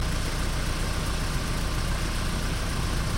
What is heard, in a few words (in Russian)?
zvuki dvigatelja